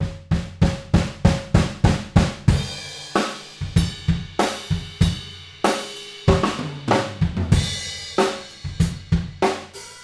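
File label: Room Mono Cardioid, drum; drum shootout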